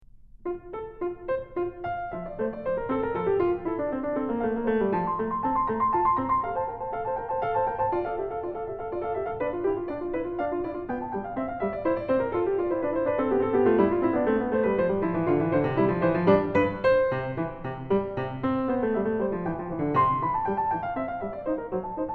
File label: Invention In F Major; Magrath Book 07; BWV 779